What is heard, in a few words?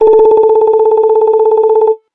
Low Bell; AX840 Tritan; LG